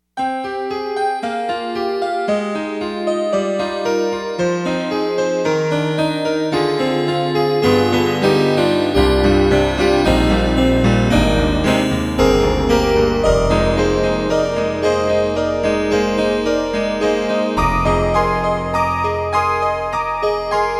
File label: YAMAHA TG55 Piano Mist; TG55発売：1989年